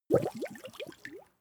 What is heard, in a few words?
swamp oneshot bubble